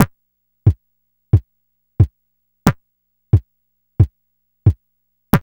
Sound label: TICK PULS; E-MU Producer Series Vol. 6 – Analogia Project